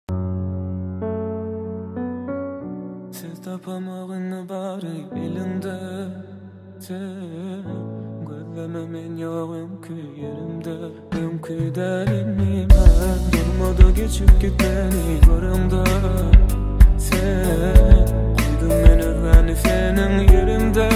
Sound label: acoustic version